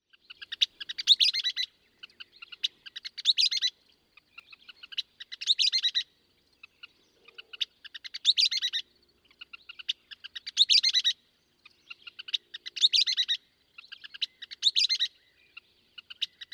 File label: Western Kingbird